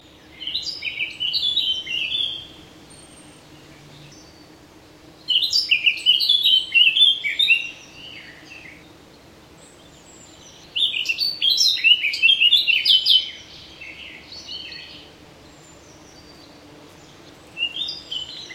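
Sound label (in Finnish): mustapääkerttu, lintuäänet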